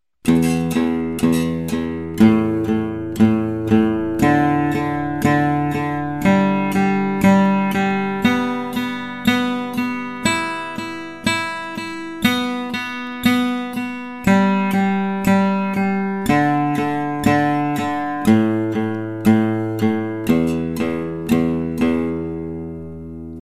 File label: upstroke, guitar